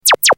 Short Laser Gun Sms

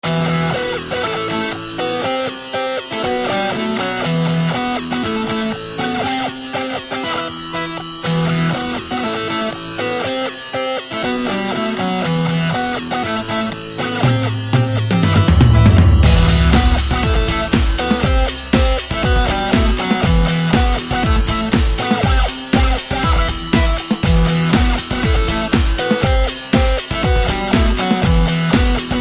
Hard Rock

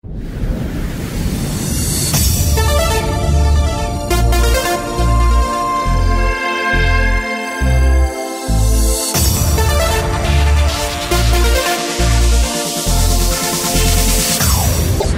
Techno Tune

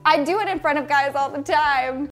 Farts

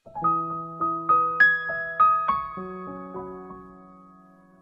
Message Tone

